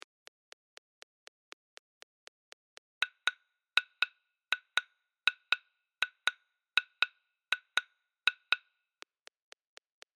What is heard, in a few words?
Soko 120; Afrika